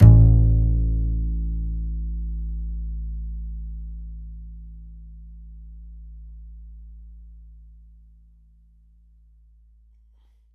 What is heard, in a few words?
A, Upright Acoustic Bass; 1ST POSITION; Larry Seyer